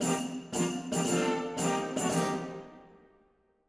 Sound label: fanfare; SoundsCrate